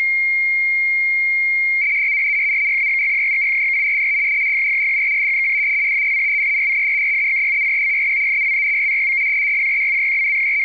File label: rtty150